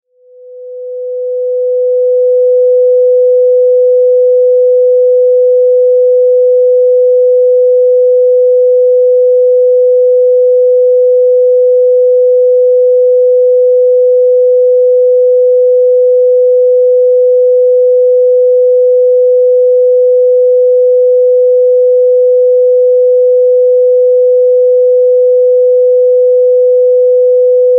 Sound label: 500 Hz Test Tone